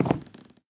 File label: wood, step; ayuncraft-1.5